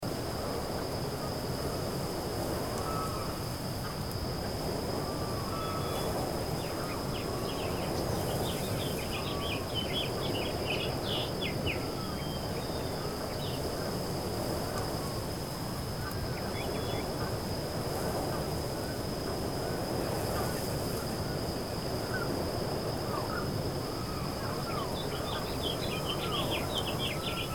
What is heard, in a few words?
Ambient; Flippin Kaktus